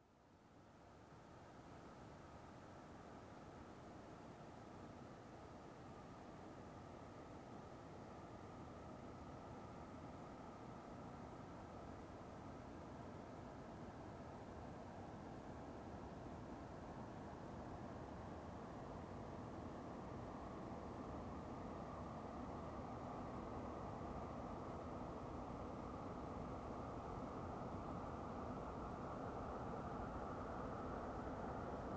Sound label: Car approaching and parking